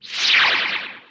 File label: attack; dexomon2